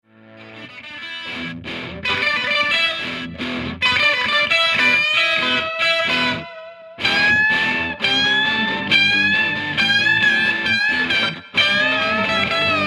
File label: Rhythm